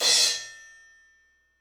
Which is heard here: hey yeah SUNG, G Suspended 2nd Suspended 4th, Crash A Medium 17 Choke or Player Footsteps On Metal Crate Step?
Crash A Medium 17 Choke